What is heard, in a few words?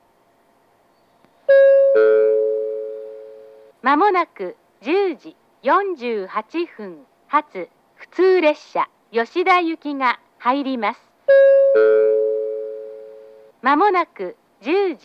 local, station; sekiya; jr-east